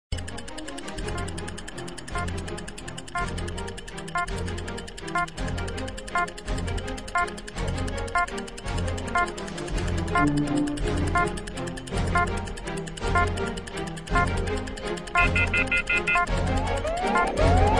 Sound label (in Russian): sekundomer piki naprjazhennaja muzyka zvuk sireny otschet na 20 sek; Звуки обратного отсчета; с музыкой